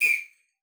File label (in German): TRILLER; Xsample VOL-9